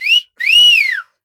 fiu fiu efeito sonoro

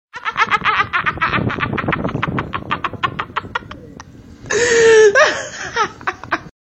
risada absurda

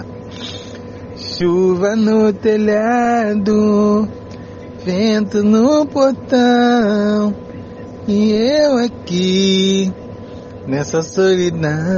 chuva no telhado

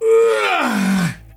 uhhraaihh espreguicando

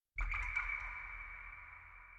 gotas caindo; rpg

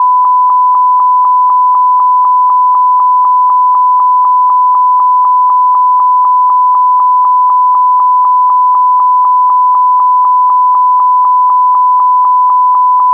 beep repetitivo

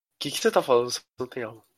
e eee

